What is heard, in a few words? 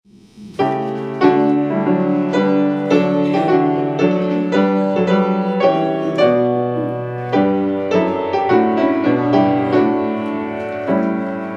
hymn; Create My Soul Anew